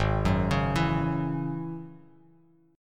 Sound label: Ab 7th Suspended 4th Sharp 5th down Bass Standard; Ab7sus4#5; arpeggiated; chordshape; JGuitar